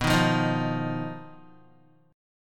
B Major Flat 5th B Guitar; BMb5; strummed; 6,6,5,8,5,x

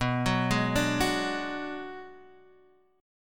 B-Minor 6th B down Guitar Standard; Bm6 arpeggiated; JGuitar

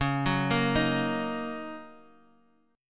D sharp Minor 7th C sharp

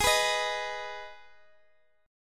G sharp Minor G sharp down Guitar Open G, chord

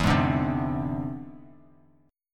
E Augmented 7th E down Bass Standard; E+7 strummed; JGuitar

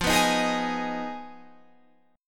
G sharp Minor 6th F

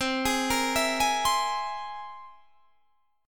C Augmented 7th C down; arpeggiated

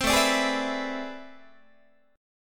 A-Minor Major 11th Guitar, AmM11 chord; strummed; JGuitar

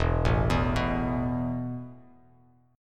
E Suspended 4th E, down, Bass Standard; Esus4 arpeggiated

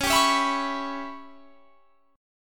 C sharp Minor 7th Flat 5th C sharp; C#m7b5; strummed

